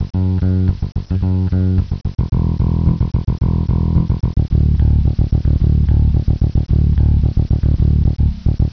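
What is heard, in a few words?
Riff 110 F, Bass; Sonic Foundry (Sony Creative Software); Crimson Blue and Fabulous Horncraft 4 RnB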